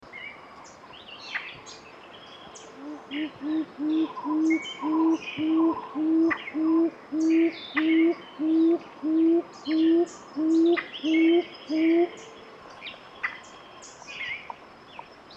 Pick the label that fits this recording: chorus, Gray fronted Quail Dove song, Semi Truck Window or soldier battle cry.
Gray fronted Quail Dove song